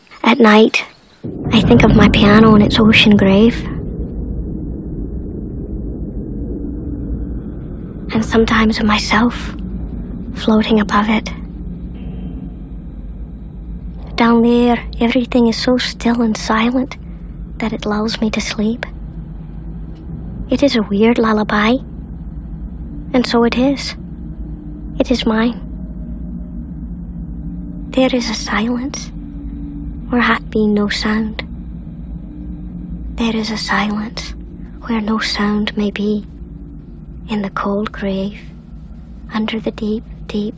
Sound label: piano